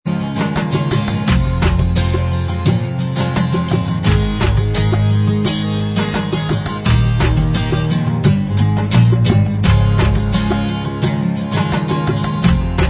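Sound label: acoustic version Instrumental Track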